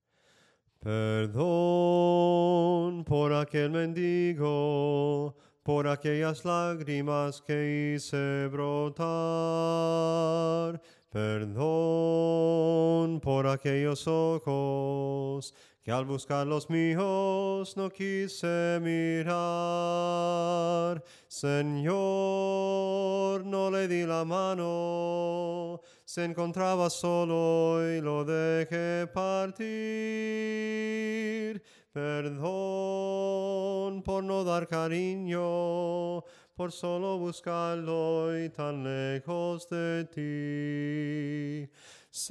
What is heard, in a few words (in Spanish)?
SOPRANO CANTADO; cantos del camino